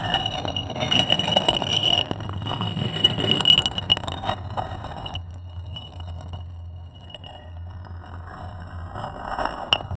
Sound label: sound of a long metal, rod